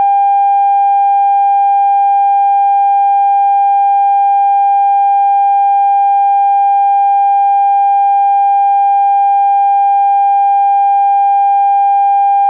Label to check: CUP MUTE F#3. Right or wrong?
Wrong